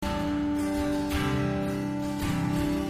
Strummed G, C 82

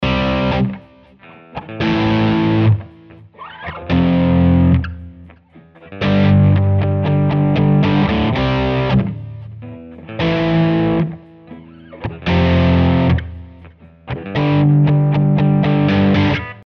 Chorus Rock n Funk C

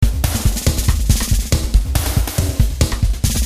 Crankin 140

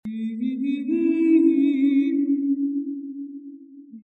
Hall 4 Classical 105-135

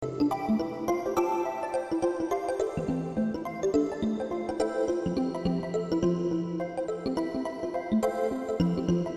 Organ Fmaj7 105

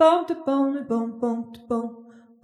Jazzy Solo

